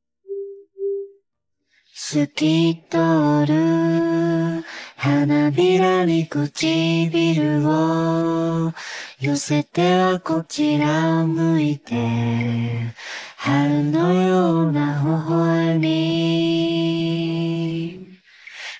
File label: vocals; kokomi; genshin impact